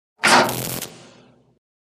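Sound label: Large Switch Fldlight, switches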